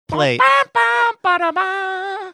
mouth trumpeting saxophone riff

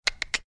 clicking his tongue

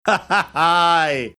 hahahi

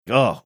ugh

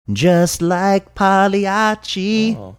singing just like

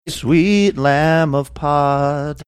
singing sweet lamb of pod

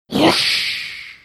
making toilet flushing sound

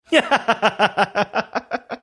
laughing

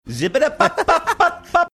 scatting

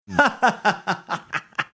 laughing

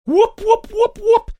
whoop whoop whoop whoop

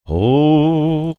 melodic ohhh

